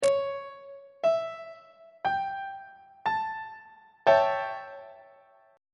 a dominant 7th 1st inversion; treble clef

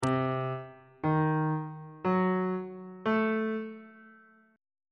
d double flat diminished 7th chord intervals; bass clef

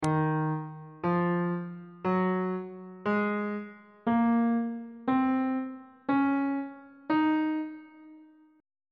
d sharp dorian mode note positions on bass clef

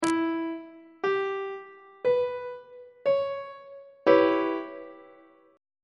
d double sharp minor 6th chord on treble clef

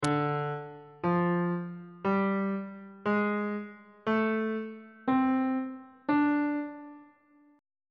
d blues scale on bass clef

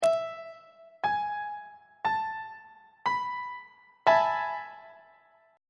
a major 7th suspended 2nd 2nd inversion; on treble clef

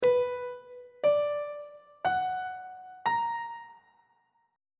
b minor major 7th chord on treble clef

